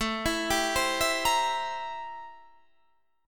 A-Minor 7th Flat 9th, chord

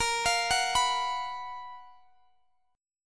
F sharp Major 11th A sharp

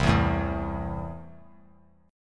C 6th C down, chord; C6 strummed

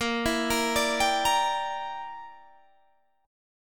A sharp 6th Flat 5th, Guitar Standard; A#6b5 arpeggiated; JGuitar

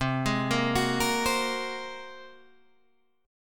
Bb 7th Suspended 2nd Sharp 5th C

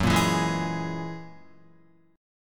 Gb 9th Gb down Guitar 4F,4A,5C,5G,5C,6E; Gb9 strummed; JGuitar

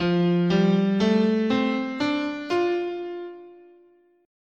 F Major Pentatonic, scale

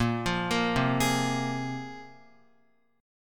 Bb 7th Suspended 2nd Bb, Guitar 4E 4A 5D 5G 5C 6F; Bb7sus2; arpeggiated; JGuitar

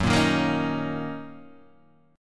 Fsharp 6th down Guitar Open D

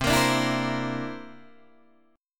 C 9th C down Guitar Open D

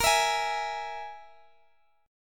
E Major Flat 5th

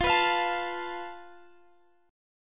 F Major Flat 5th, down Guitar Drop D

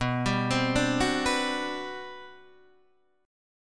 G Major 11th B

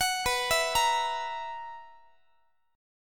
B Major 7th Suspended 4th, B, down, Guitar; BM7sus4; arpeggiated; x,x,21,21,20,21; 4A,4E,5A,5D,5G#,6C#

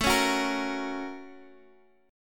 F sharp Major A; F#/A; strummed

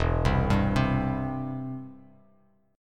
E Minor Sharp 5th E down Bass Standard; Em#5 arpeggiated; JGuitar

